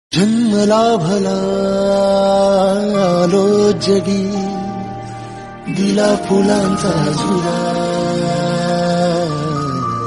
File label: Bhajan Marathi